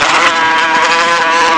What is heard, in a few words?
Buzzing hornet short